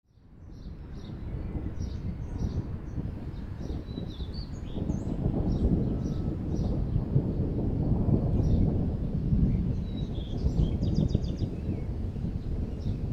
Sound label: Thunderstorm sounds sleep